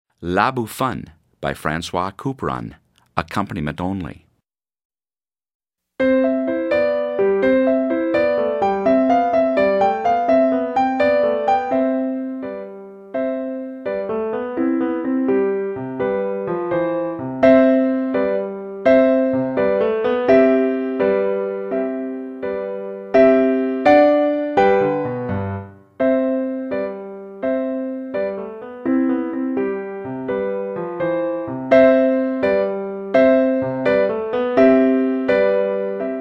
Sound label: Horn Accomp only; French Horn Piano Only – Performance Tempo; La Bouffonne